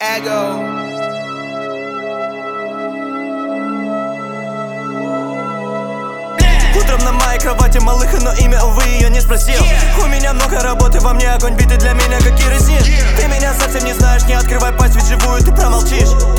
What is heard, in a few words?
boing